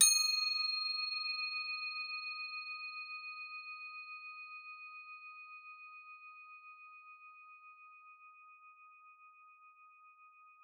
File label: CROTALES D#4; Xsample VOL-4